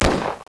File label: Gunshot; Demo Man